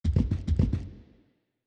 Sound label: walking Wild Movement; godsoffortune